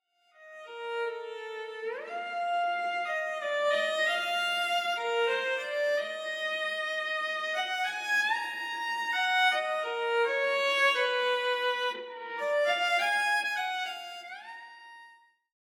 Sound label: Restaurant Violin Music Sample; Bugsy Malone backing tracks